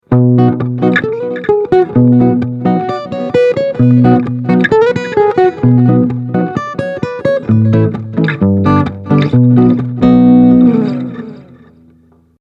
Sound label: Behringer ACX450 Delay